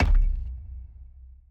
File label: Super Heavy Object; TANK; 3Souls